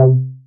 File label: Synths BASS